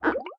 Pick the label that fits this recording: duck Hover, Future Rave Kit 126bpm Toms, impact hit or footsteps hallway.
duck Hover